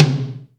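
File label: GATE tom; Crypton; DrumBase5000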